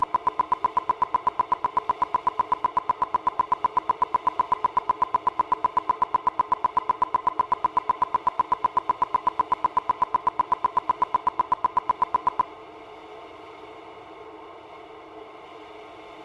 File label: burst 16ms 1kHz; Mic2 guida; CESI; LaSpezia